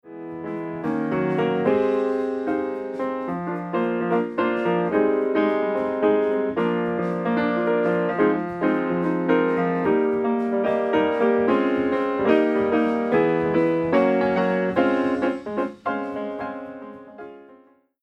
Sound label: Piano Off EQ On